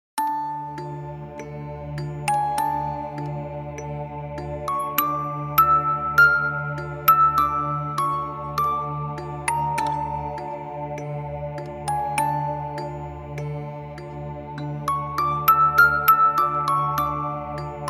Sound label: creepy doll halloween music